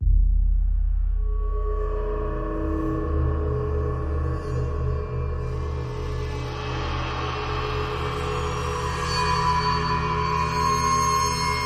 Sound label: Eerie